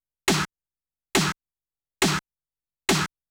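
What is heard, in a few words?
snare drum, techno 138 bpm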